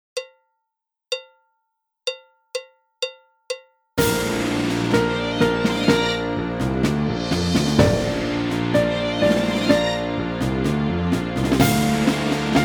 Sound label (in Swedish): Partaj o baluns 126 bpm, trombon trumpet